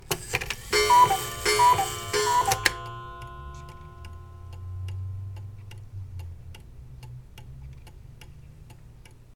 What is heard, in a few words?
Coocoo Clock 3 Oclock With Tick